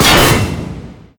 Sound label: hydrant body impact; Explosions; Call of Duty Modern Warfare